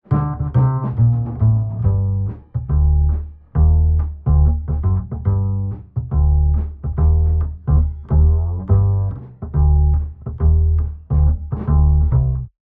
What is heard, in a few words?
Bass, Jazz for piano